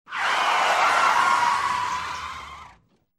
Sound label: Solo Spin, Car Tires; Ambient; zps